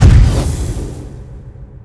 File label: AC Fire; RYL 2